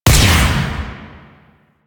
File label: Pistol Charged Shot; Weapons; Warframe; Drifter; Operators